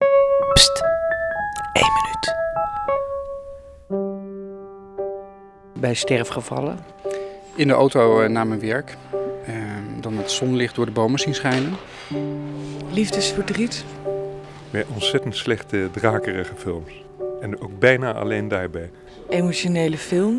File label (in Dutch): huilen